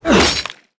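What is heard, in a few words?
fire damage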